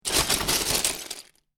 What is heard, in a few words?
Lego Grab Handful Of Lego From Lego Box; Miscellaneous Toy Sound Effects; LynneMusic